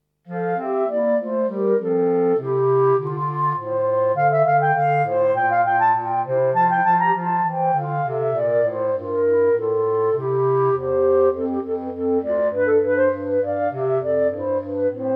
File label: horn